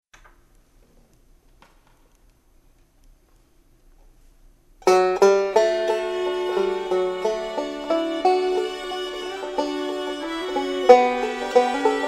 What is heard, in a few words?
fiddle, banjo